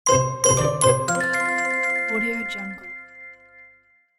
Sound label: level up alert; game sounds